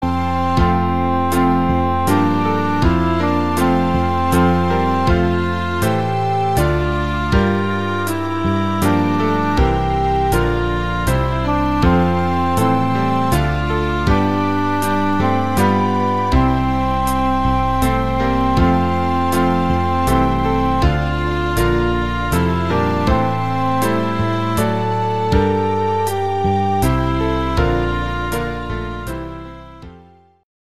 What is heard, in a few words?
alto; away in a manger